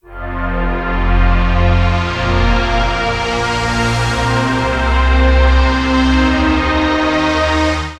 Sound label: Big String; Sonic Foundry (Sony Creative Software); The Electronic Music Manuscript Collection